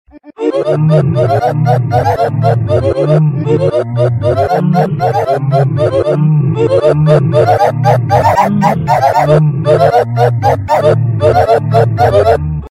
oof; svencoop; FZSaySound

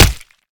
hit, knuk; svencoop; ins2

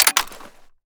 open, mg34; svencoop; ins2

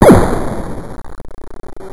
ric, debris

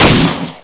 sniper; weapons; svencoop; tfc

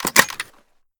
open, m60; svencoop; ins2

